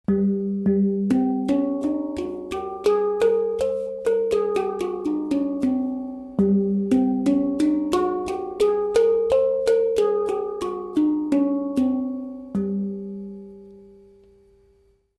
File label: Pelog, hang